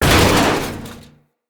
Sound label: Internal Ship Damage; Vehicles; RailJack; RailJack Hit Effects; Railjack Hull Hit Effects Internal